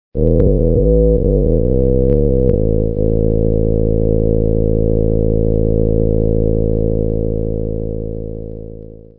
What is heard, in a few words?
infrabass